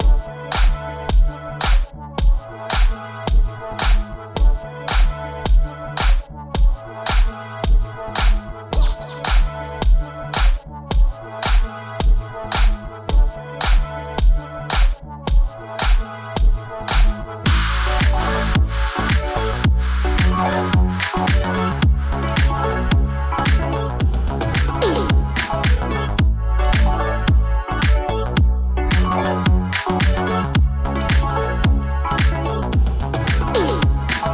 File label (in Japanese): EDMかっこいい系; yuttari sound